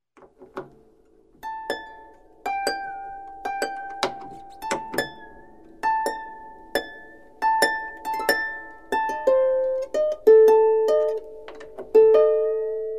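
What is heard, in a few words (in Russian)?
звук настройки арфы перебор струн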